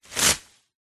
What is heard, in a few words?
tree branch thick healthy leaves impact slap against surface